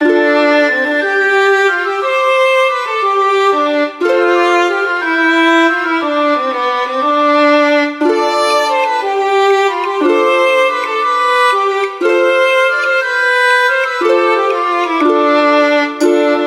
Spring Village

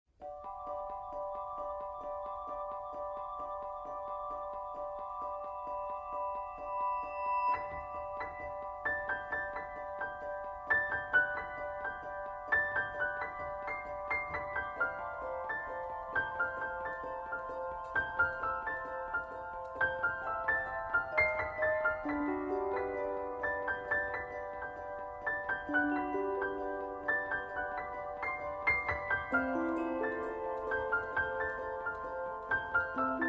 music ambience; inside headquarters; inside out

